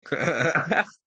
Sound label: hehhhahhaha